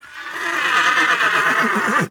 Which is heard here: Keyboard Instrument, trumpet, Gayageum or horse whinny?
horse whinny